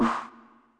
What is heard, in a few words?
scrub, Yamaha Tenori-On; Scrubkit